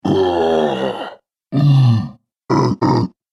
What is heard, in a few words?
Giants Ogres, Monster Sound Effects